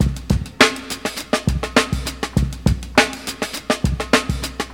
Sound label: 102 bpm 80s funk drum loop sample c key, kick tuned to the C note